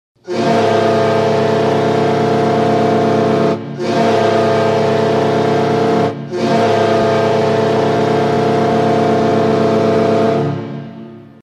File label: Goal Horn; Canes